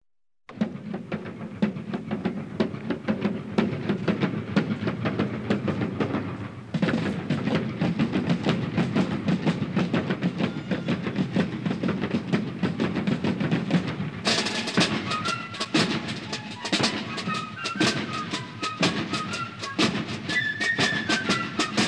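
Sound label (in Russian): парад барабаны и флейта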